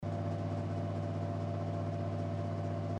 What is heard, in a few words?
cage